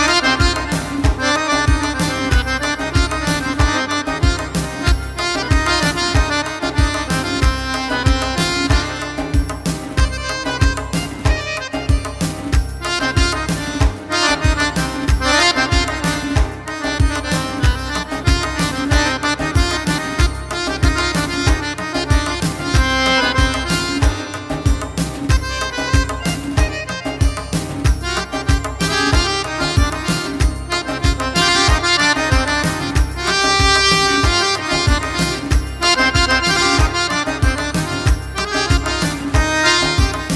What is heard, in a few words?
Accordion